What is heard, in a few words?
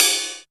ROCKY CYM; Zero-G; Funky Elements